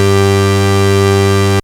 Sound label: E Lead G2, Moog Voyager; Moog Voyager Sounds; VES1 E Lead